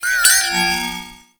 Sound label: Follie Enemy Movement; Warframe